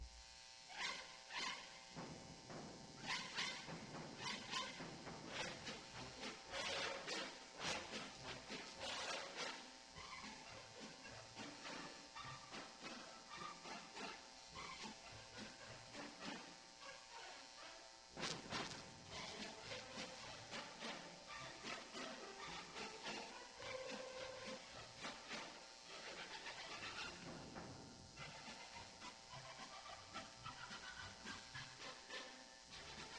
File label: Polka; music to sell weyerhaeuser hardwood paneling